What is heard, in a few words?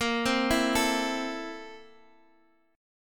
A Minor Bb, Am/Bb chord; Am/Bb arpeggiated; JGuitar